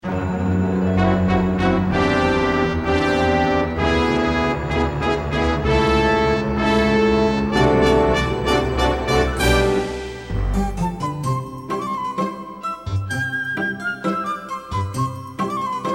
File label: Renaissance Flute